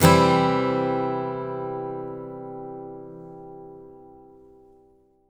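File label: acoustic guitar C major; Sampleheads; Whole Lotta Country 1 & 2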